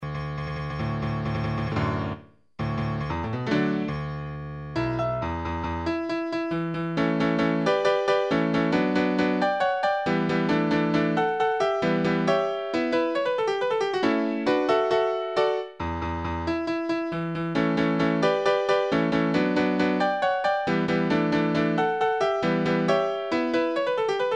Broken Piano

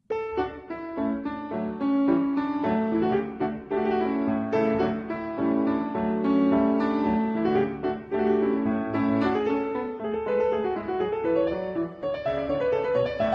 bach partita D major bwv 828; onclassical

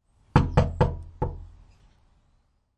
knock

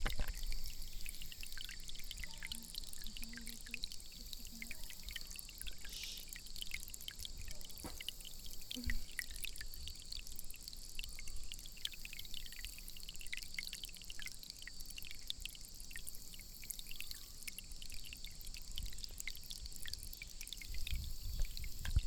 water, Malaysia Jungle; Tamen Negara Malaysia

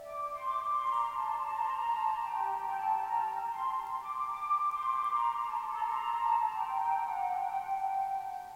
Flute; Cumbria Remixed Sample Pack

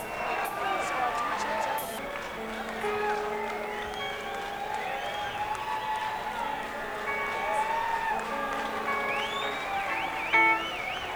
Crowd Tuning

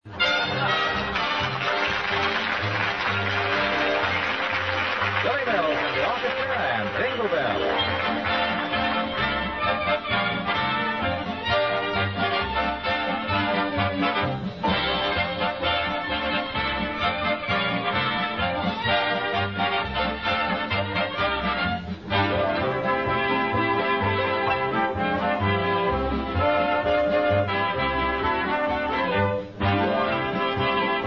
Jingle Bells; Fibber McGee And Molly; fibber Snoops For Presents In Closet

